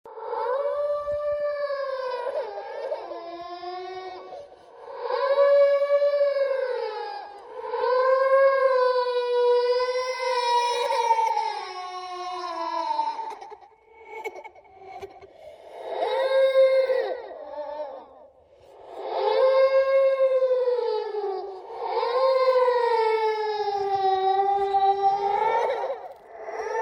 little ghost girl crying and weeping scary sound effect